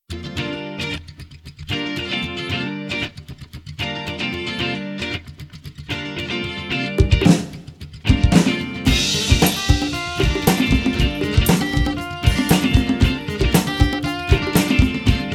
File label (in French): Playback Basse